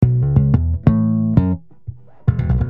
Bass Slap Gb 088

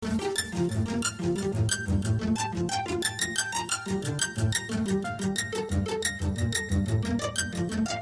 Gtr & Pizz Echoes 90 Gm7

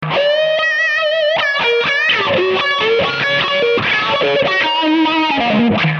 Eb 080 100 solo Wah

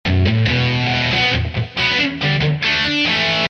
Bm 140 rhythm

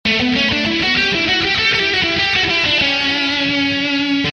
98 lead

